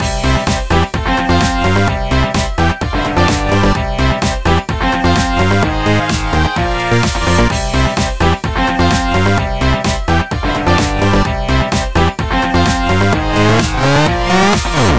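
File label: background music skill; dj and rockstar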